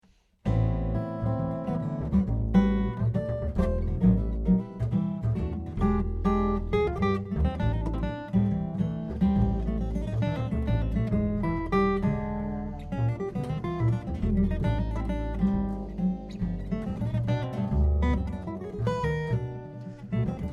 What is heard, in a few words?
Mood Swings